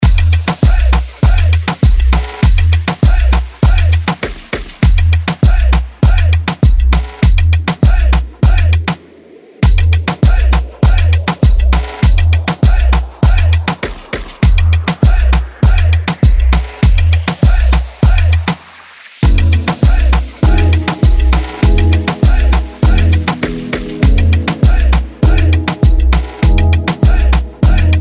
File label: REGGAETON BRK